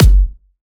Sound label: Dubstep Kicks; Vengeance - Essential Clubsounds Vol.5; Bassdrums